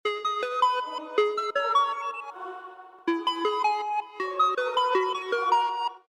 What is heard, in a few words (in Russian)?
Короткий звонок; короткие, зарубежные, стандартные, без слов